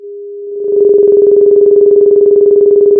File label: pop, Sound of a phone ringing